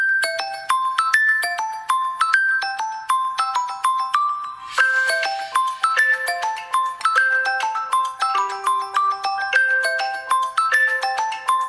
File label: Xiaomi Stock Ringtone